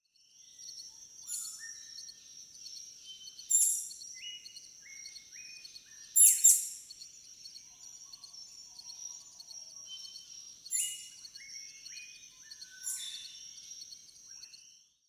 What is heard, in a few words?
daintree bat call